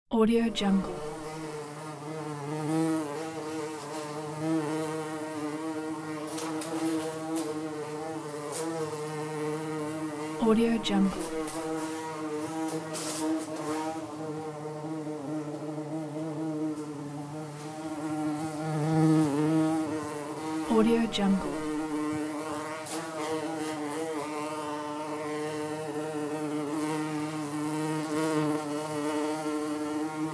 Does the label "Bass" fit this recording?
No